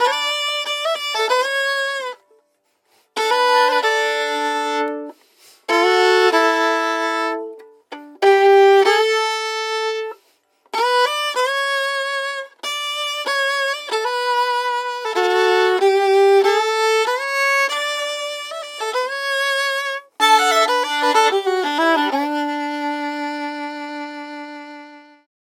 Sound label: solo